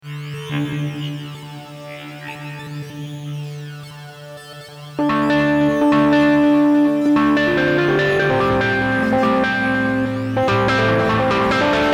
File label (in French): Instrumental Theme